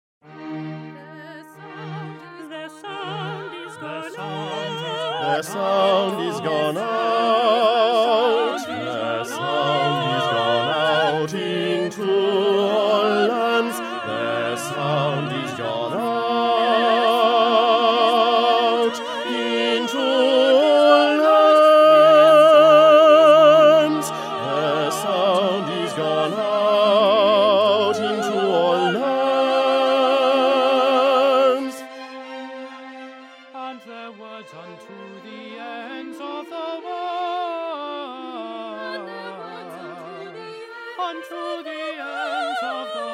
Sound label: bass, messiah